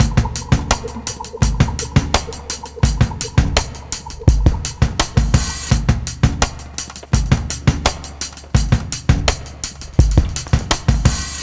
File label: 84 bpm, breaks; Zero-G Beneath the Planet of the Breaks